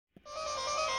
Horn; MK8; Waluigi

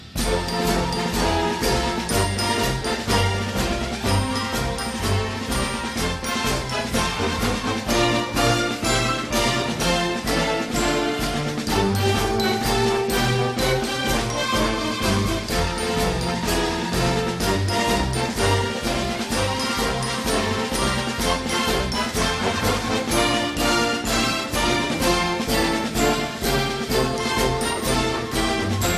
Airplane Music

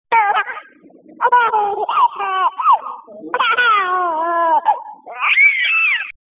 laugh; children

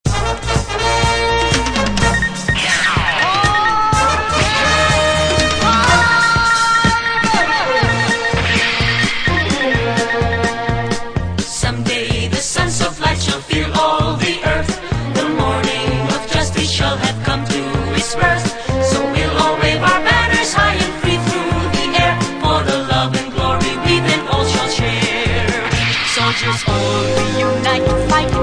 english; children

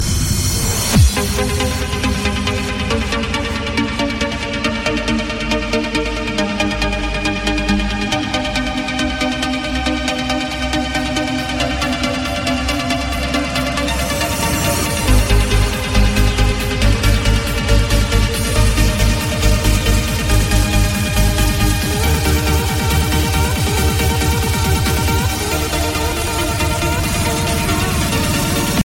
Trance Ringtone

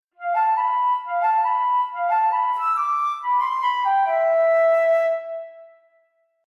ocarina song

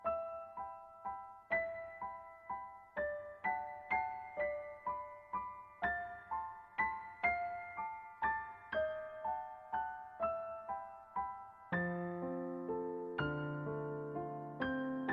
Fireside Dance

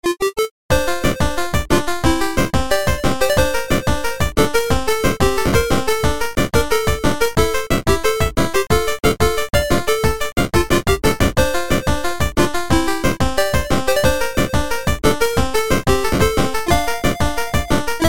8 bit; SAO

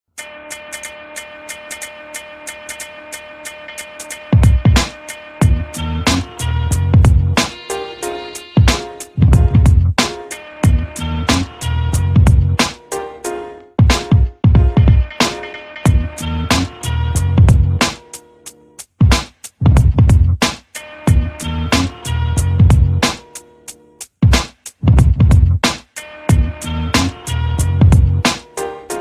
black beat, hip hop

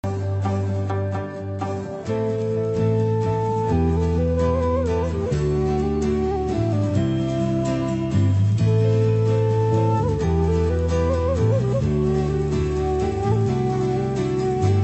Beautiful Flute

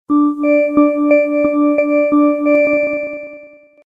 Long range Bass

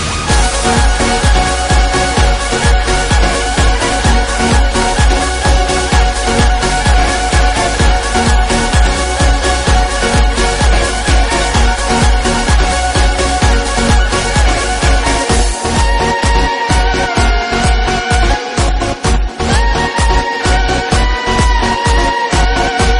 Electric Violin

